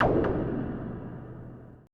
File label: AMBI FX